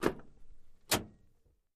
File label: Camaro Door Open Thic, chevy camaro